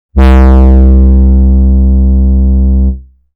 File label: Basic Pulse, synthlead